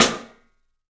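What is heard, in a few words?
PICOLO SNRS, snare, drums; 3D Sound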